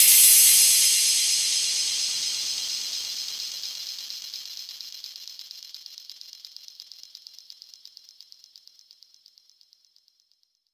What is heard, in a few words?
Downsweeps